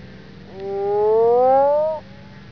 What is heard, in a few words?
mexican burrowing toad call; texas nature trackers; amphibian watch